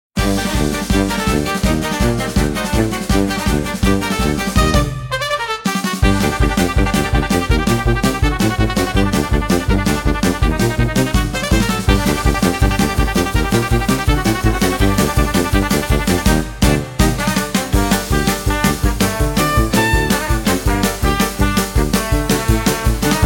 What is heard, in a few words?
Carnival Stampede, upbeat carnival